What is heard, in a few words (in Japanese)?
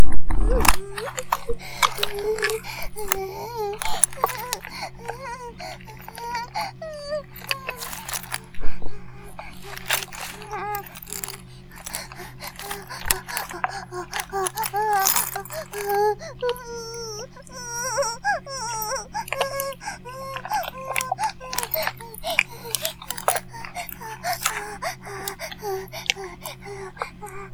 バイブ放置; イクたびにわけて